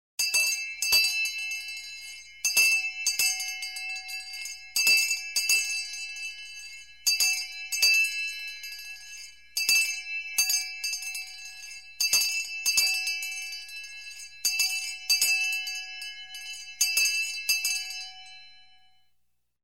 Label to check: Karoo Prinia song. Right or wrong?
Wrong